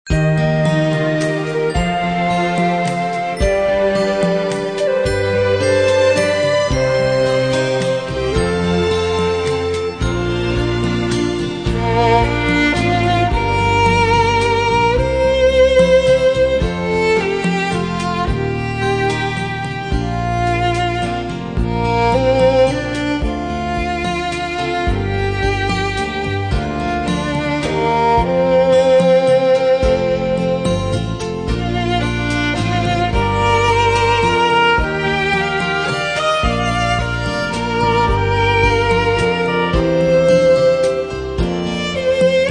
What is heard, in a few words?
Instrumental Praise